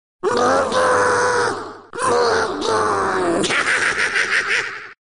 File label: boom boo